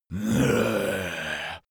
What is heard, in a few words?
zombie moan idle